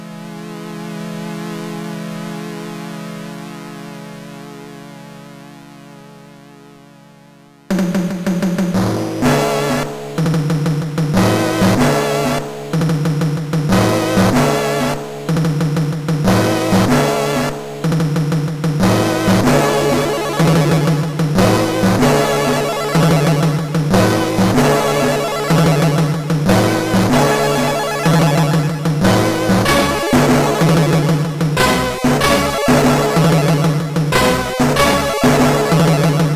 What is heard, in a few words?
Orchestra, sid MOS6581R3; hvsc